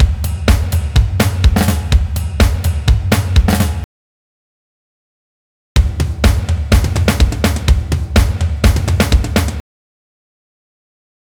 Indie Rock Drums 125 BPM; Ударные (Drum Loops)

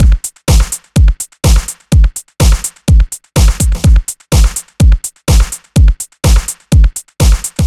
Backed Beat; Disco House

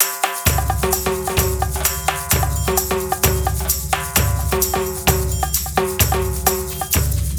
Big perc C

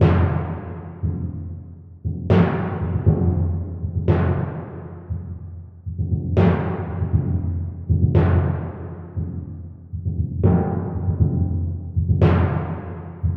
timpani; 118 bpm

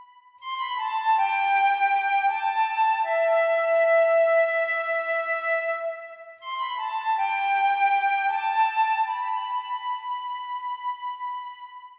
Flute; Сэмпл флейты (Movie Score): Flute C; 80BPM; film music; woodwind; духовые инструменты

